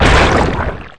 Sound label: molten plastic launch; Tanks